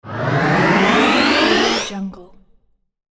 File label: robot power up